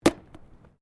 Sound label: Witches Hat Impact, Traffic cones; Miscellaneous Impact (Sound Effects:Impacts)